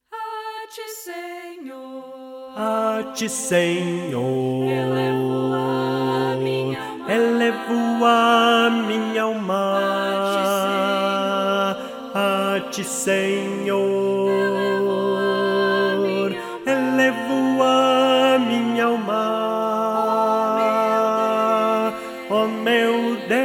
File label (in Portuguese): a ti senhor tenor